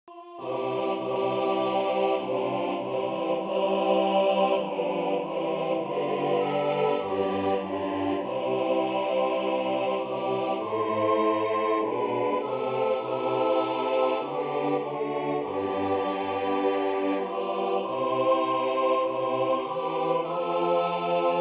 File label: soprano; It Is Well